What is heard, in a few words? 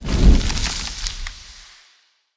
Fey Ward Mode In; Powers